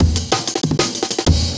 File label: Layered Amen Short, Breakbeats